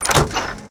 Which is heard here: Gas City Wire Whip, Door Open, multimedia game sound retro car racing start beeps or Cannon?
Door Open